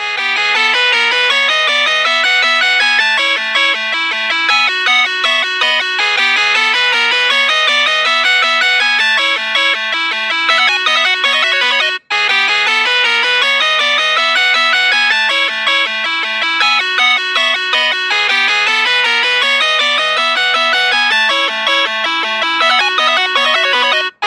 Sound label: Samsung Arcade, ringtone